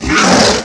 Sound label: were wolf attack